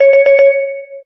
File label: dingy; Zaps and Beeps; aol